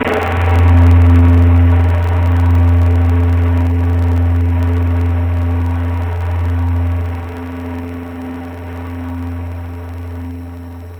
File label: Pan Flute; Synthetic's Serum Collection; Echo Sound Works Sphere